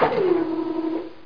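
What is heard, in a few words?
elevator stop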